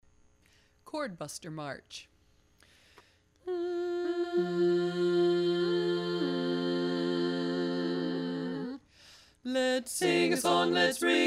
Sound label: March, 4 Part; learning tracks